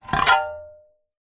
industrial shovel metal pick up from concrete ground